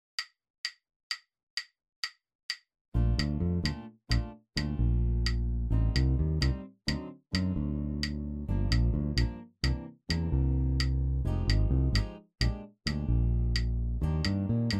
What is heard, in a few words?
bass in 3